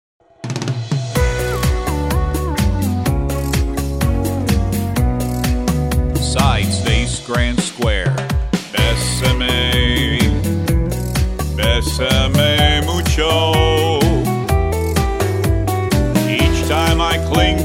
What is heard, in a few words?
vocal